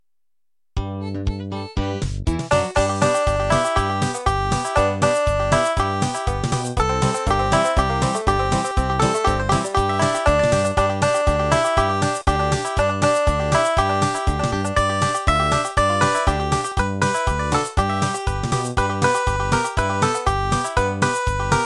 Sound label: banjo